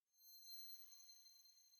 Env Field Night Ground; the legend of zelda tears of the kingdom

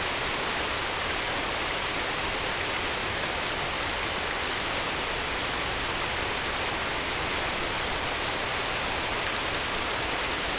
Amb Rain

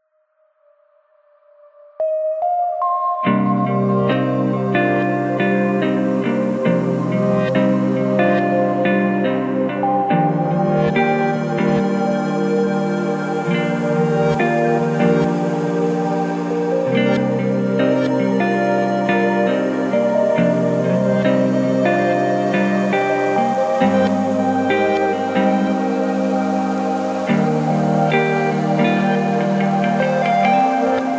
Mus Grasslands Stem; Epitasis

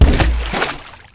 Rafters Hit

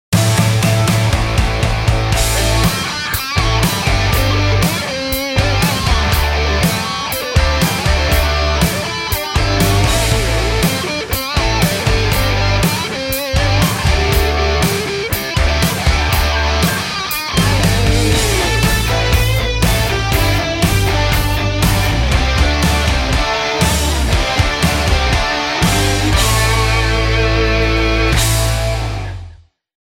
Production Music; Invicta Beam

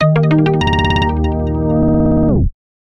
Startup; Friday Night Funkin' Unresponsive

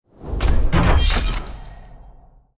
Win screen; Drifting Lands

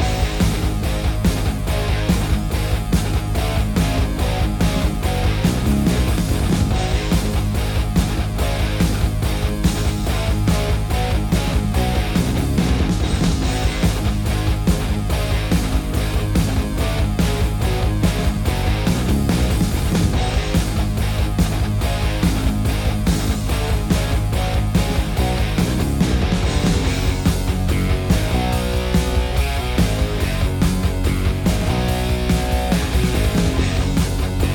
beat

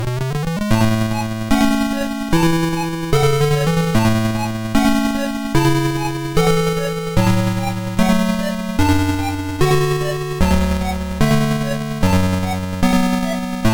Stage BGM; time soldier atari st gamerip 1989

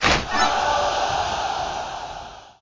Football goal

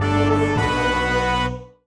Level Up

